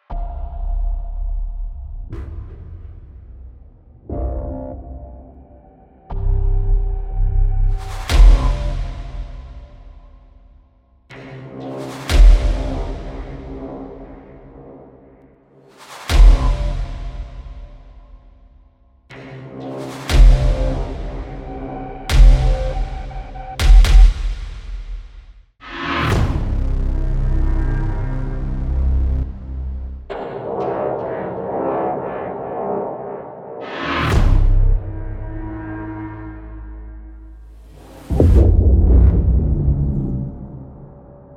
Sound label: Cinematic SFX; Ghosthack; Cinematic SFX Volume 3